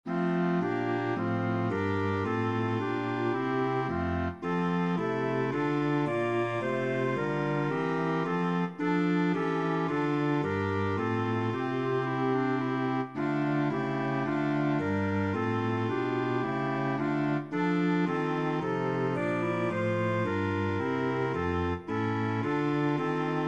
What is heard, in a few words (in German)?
Der Mond ist aufgegangen Melodie